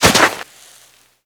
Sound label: land sand gritty; footsteps